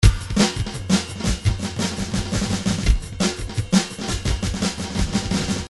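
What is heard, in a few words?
drums 170 hi hat; Paul Kodish Dangerous Drums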